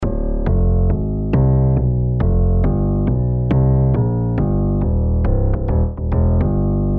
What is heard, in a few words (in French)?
piano basse; best service; Galaxy II Grand Piano Collection; galaxy piano 2